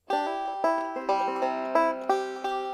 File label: pick, neck wood